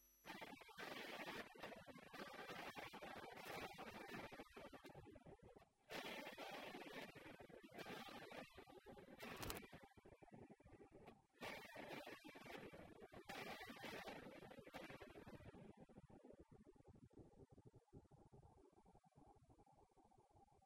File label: Komet Jr